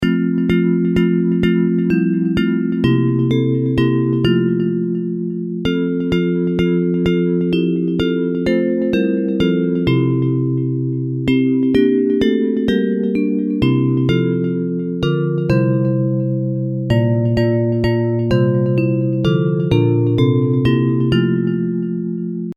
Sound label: Bells; Hymns